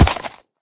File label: land, horse; minecraft